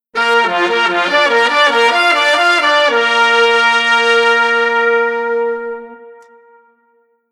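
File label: Horn Unison 2 Trumpets Trombone Alto Sax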